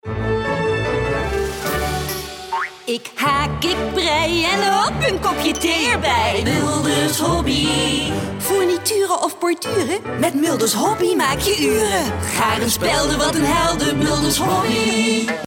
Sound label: dutch female singing